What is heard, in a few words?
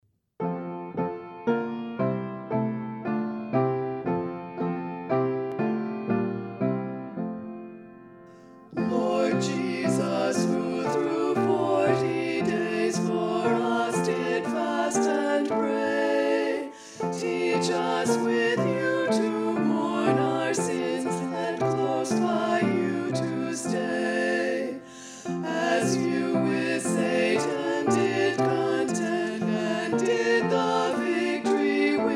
HYMN; Lord Jesus Who through Forty Days